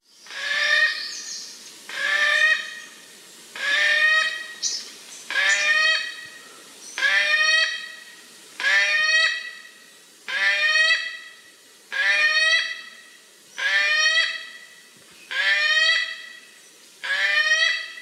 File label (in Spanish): SONIDO PALETON